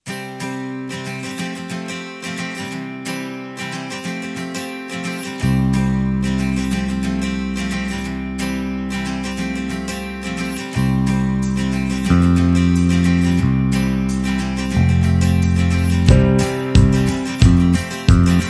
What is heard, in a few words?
Upbeat